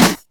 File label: Claps & Snares